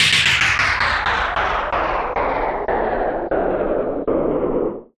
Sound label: Crank Stop